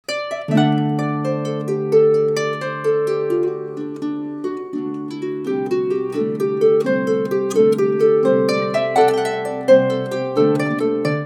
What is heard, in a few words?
NYLON Celtic, harp